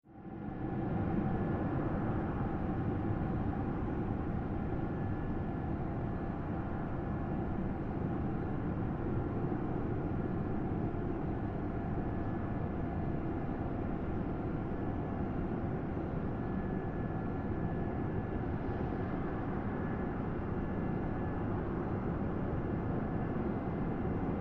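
Low Frequency Factory Ambience, industrial ambience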